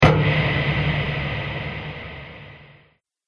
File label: Huge Reverb Drop; Hit Sound Effects